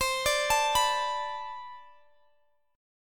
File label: B-Minor 7th C; Bmin7/C